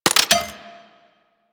Laser Aim Rifle Mech, Weapons; Grineer

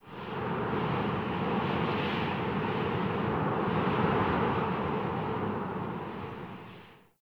Under City Wind Heavy Fast Gust

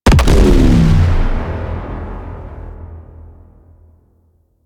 Obelisk Blast Blue; Warframe; Infested Eris

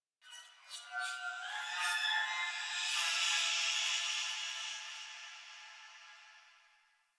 Texture Interior Small

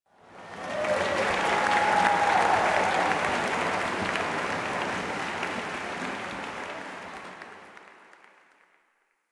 Amphitheatre Crowd Applause; Ambience; Duviri; Warframe

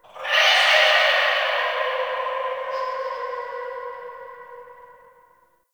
Root Cave Distant Chatter; Ambience; Neathuns Root Cave; One Offs

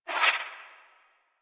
Swing, Melee; Weapons; Balance Four; Warframe; Modular Melee

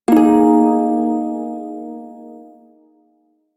Prime Shawzin Yo Chord H; Music; Warframe

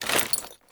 generic foley; Warframe; Warframes; Dagath

